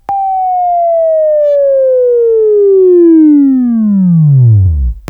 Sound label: Buzz; Labview-Program; Sweeping Training program